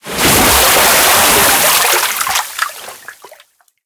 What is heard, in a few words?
enter the water, tanks; engines; War Thunder